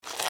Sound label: Item Collect